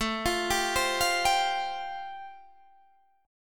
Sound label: A Minor 7th Sharp 5th A, Guitar, DADGAD; 19,20,17,17,20,17; arpeggiated; Am7#5